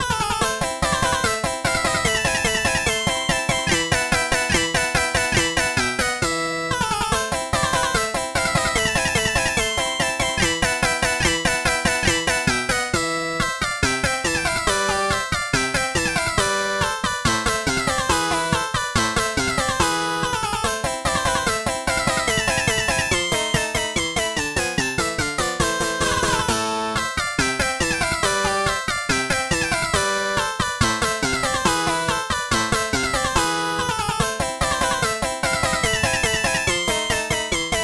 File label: pop bass